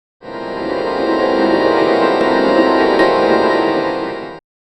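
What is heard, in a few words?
fun minimal ascending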